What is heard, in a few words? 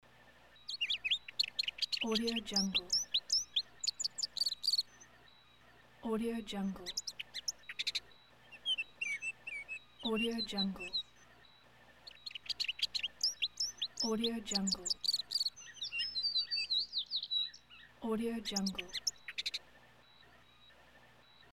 spring garden ambience loop